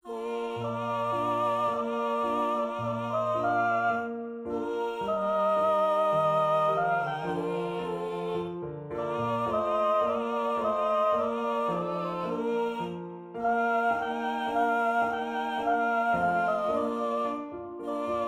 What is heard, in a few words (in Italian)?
Quartetto; Fra tutte le pene